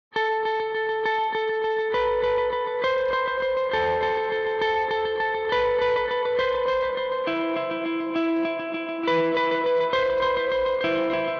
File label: c gtr